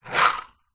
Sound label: leisure toy lego car push wood lift wheels spin air